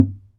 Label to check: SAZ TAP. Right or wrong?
Right